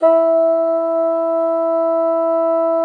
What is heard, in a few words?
BASSOON E4; Xsample VOL-6